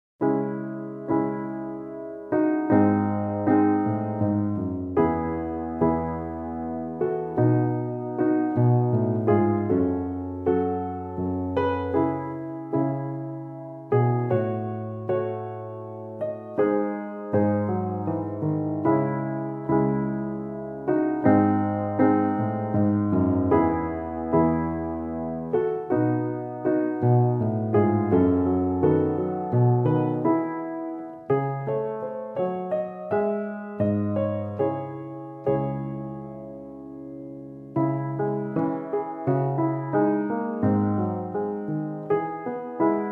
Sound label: Erard grand piano, Sarabande; Haendel; edition-beurmann